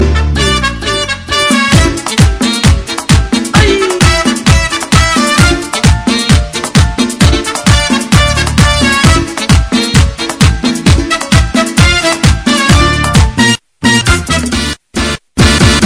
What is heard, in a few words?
samba tone; ringtones; latino